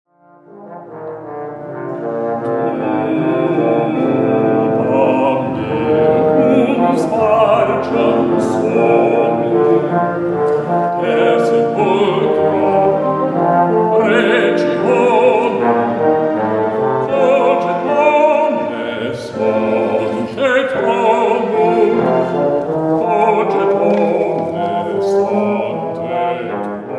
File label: Bass Tuba mirum Requiem; Mozart